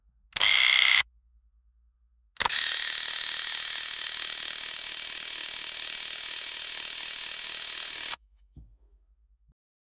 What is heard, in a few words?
rapid camera shutter